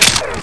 sniper bolt; weapons; unreal2

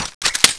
reload left, uzi

